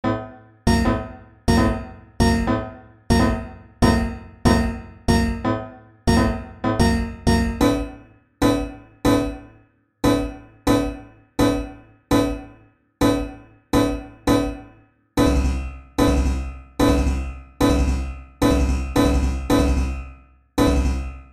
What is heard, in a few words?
loop 111bpm; adaptatio; mouvement 1; original; modifie; simplifie